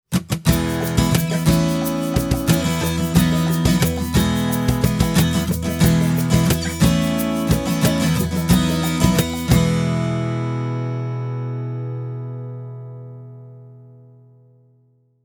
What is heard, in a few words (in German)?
Westerngitarren Noir N1; Rockway